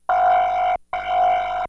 BATTERY SIGNAL; Titanic Challenge of Discovery Panasonic WIN95

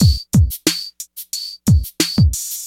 drums, Light Sounds; KILLERSOUND; Remixable SoundSets

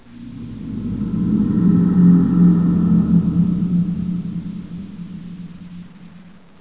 CAVE ENT; DINK

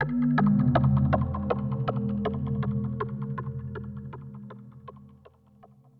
AMBNT 160; eJay; Ambient

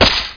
ice hit; druid

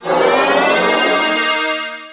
new Rank; vampire demo

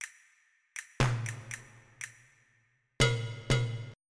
pop; rhythm

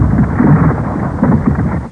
RUBBLE; Requiem

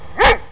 dog bark

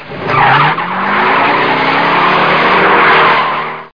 skid

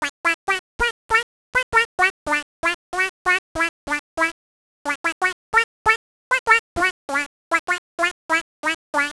duck; My Science Fair

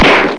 pistol; venture

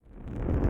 fire; kult

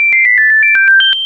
bonus; robotron

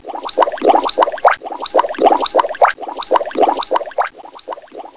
good bubble; How Many Bugs in a Box; juicebar

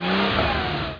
GEAR UP

